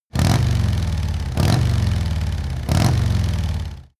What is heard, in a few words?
motorcycle harley engine low rev multiple hard